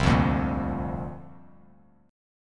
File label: C 7th Suspended 2nd Suspended 4th C down Bass Standard; C7sus2sus4 strummed